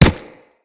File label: Kubrow Run Dirt, Footsteps